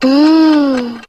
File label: "mmm" girl, Voice; ooh ahh